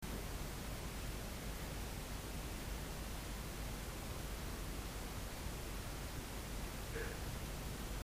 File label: sound empty cellar